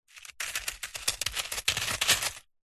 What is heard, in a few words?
insect spider large run scuttle on stones gravel sudden stop; animals